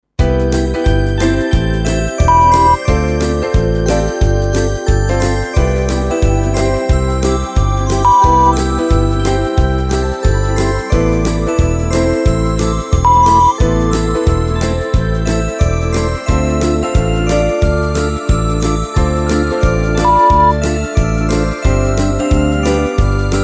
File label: XMas Swing Bells 90; unbeschwert